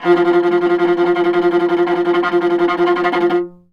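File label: VIOLA tremolo F2; Orchestral; DirectWave; Varazdin Orchestral; Viola II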